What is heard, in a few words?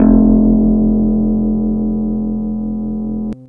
ebass